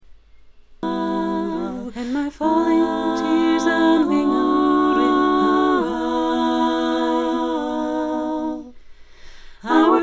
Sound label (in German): acapella Version alles gleich laut; Singalongs